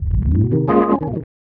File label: organ C#, vocal house; PS-28 Vocal House Samples; organs